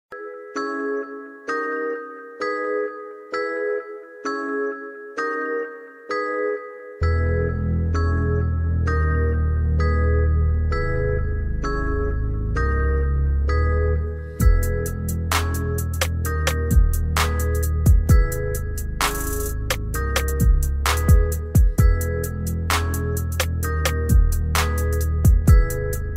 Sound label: Karaoke